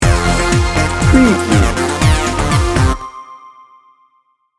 dance party sting bumper

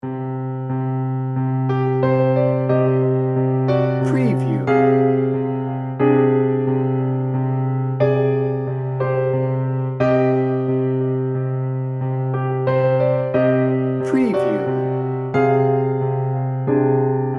dark shadows

